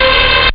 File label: beam